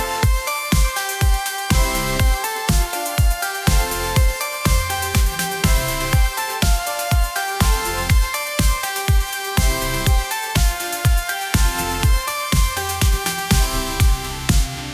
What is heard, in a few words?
upbeat pop melody; ai